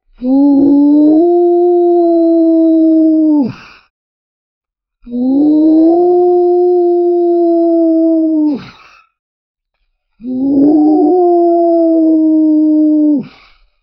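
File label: whale sounds without water, communication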